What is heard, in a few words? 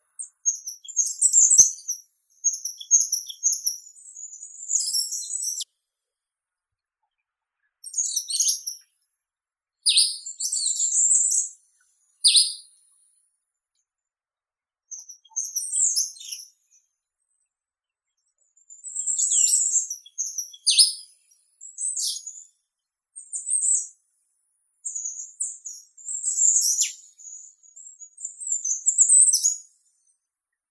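goldcrest, regulus regulus; korolek zheltogolovii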